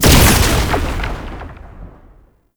quick strike cast impact; Roathe; Warframe; Tau; Abilities

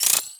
Credit Pickup Pile Small; Warframe; Pickups

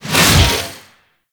Melee Whoosh; Warframe; Sentients; Erra

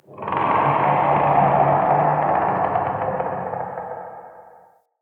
Root Cave Root Creak Large; Ambience; Neathuns Root Cave

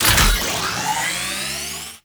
Survivors Upload Start; Encounters

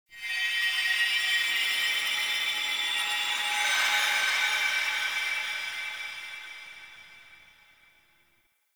Glass Particles; Warframe; Ambience; Nokko Colony

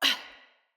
Pain Female; Battle Barks; Envoy

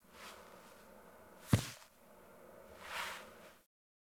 Puppy Foley Sit Idle To Down Idle; Warframe; Kubrow

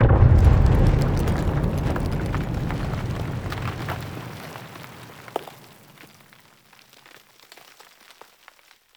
Battle Ambient Tunnel Rumble; Warframe; Tau

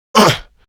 Pain, Male; Warframe; Grineer Male; Battle Barks

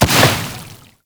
Bubblegun Pistol Hit Explosion

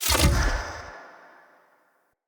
Decoy Bow Shot; Powers; Fey; Saboteur

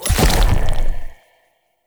Corruption Wave Spike Burst; Enemy; Abilities; Lady Deora; Characters

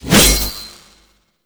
Hatchet Whoosh; Warframe; Corpus; Venus Hatchet

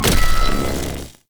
Hoverboard Jump Charge Ready; Vehicles; Warframe; Venus Hoverboard

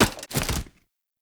Push Soldiers; Warframe; Tutorial; Vor; Cinematics

